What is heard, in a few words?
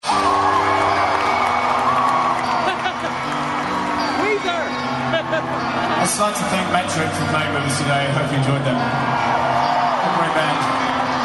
Riff